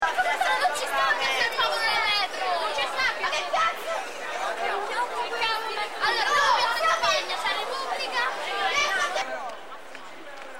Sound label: street; roma; roma2000